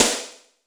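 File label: GOGO snare, GO GO DRUMS; Crypton - DrumBase5000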